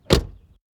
Toyota Camry Door Outside

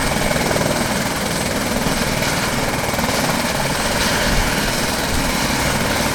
Tv Loop

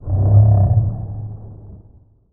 Animal Horror

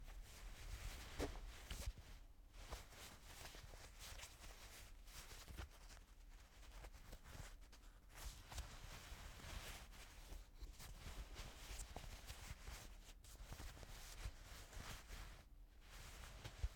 Foley Jeans Rustling Fabric Movement Sound

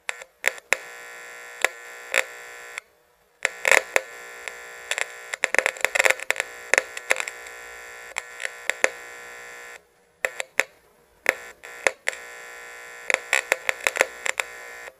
Voltage Drop

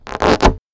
Balloon

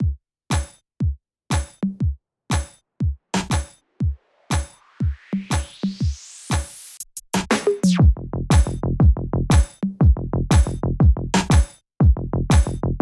Euro Club Loop

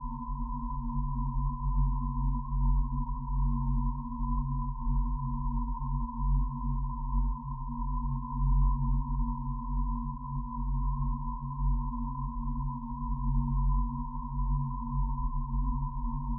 Haunting Space

